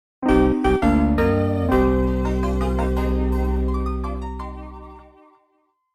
jingle ~ respite

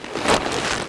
move bag; ahlbi

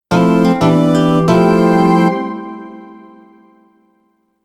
jingle

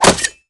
gavel open

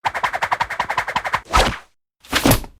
umbrella open; bonny betty

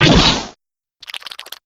eel chop; olivia

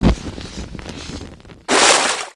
drain full; excelsius

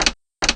latch on; rocco

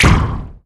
poof; bonny betty

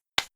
gavel touch

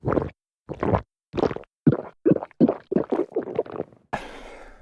transform

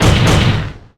double desk slam

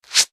knit; juniper

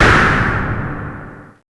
drama pound

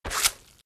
flop; bucky

